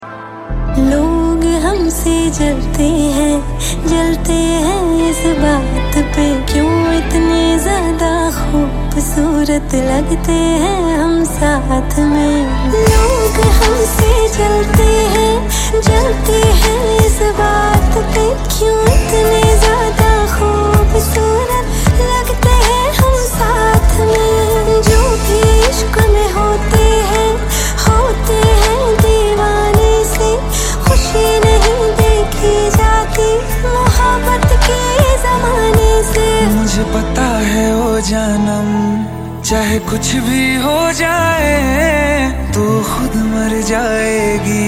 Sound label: Song Tone